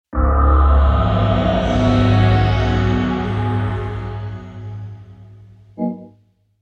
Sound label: boot up